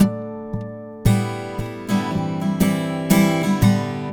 Acoustic Guitar; Guitars

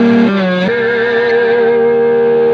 Processed Guitar, Loops; Guitars

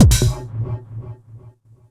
Kick One Shot, Drums

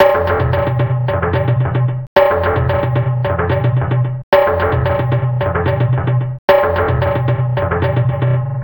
Acoustic Percussion

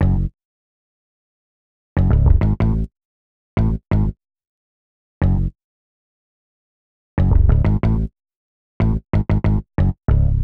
Synth Bass; Basses

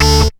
DIRT HIT, STAB; SoundFactory; SMPMANIA

